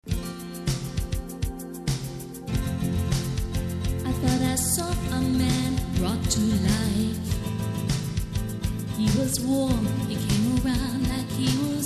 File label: Rock & Pop